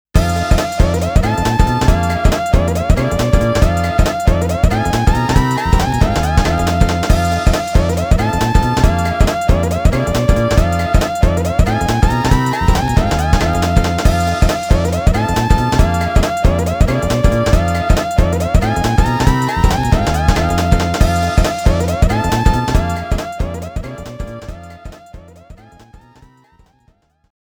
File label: Introduction MUSIC; Namco Classic Collection Vol.2; arcade soundtrack